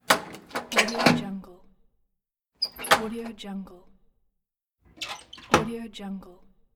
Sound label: door security latch guard